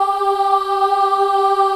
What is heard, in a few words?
G3 child ah, choir; USB Soundscan vol.28; Acoustic & Synth; AKAI; CHILD AHF